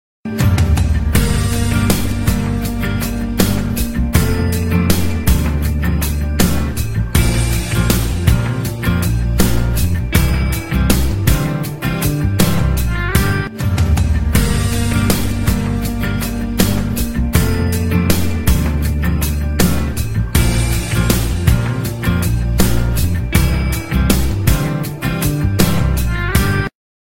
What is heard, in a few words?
Slow